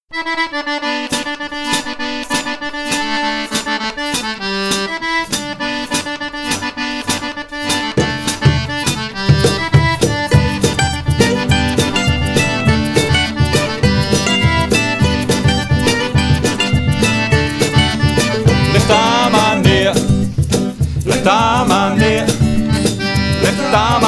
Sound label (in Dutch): French Accordeon; Petit Noir; Karel vd Leeuw; Leg Daar Maar Neer; Staalkaart